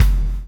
CHAIN; Spectrasonics - Backbeat

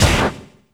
SD, 170 BPM; Ueberschall; Drum & Bass Resonance

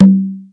conga lo, Yamaha TG-500

